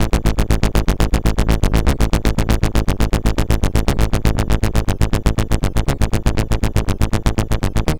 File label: C64 Bass, Commodore 64, Chiptune